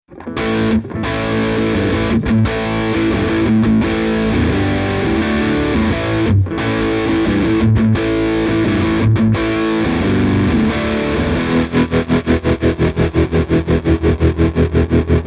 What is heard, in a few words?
hard rock